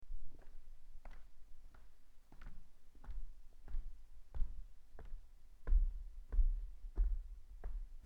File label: Wooden Foosteps; horror; horror sfx vol 2